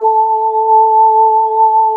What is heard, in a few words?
SYNTHY FLU